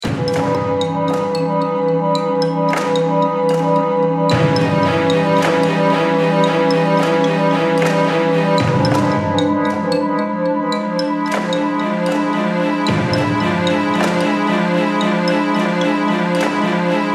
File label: Bgm Fantasy; rpg maker mv